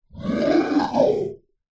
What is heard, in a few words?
science fiction alien vocalisation